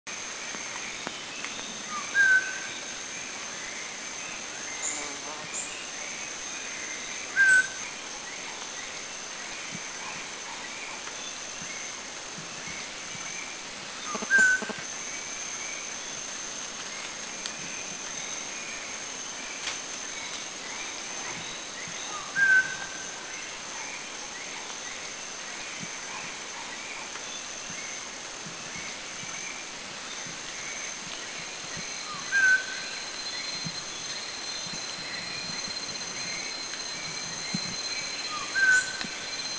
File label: White-lored Oriole Subic, Philippines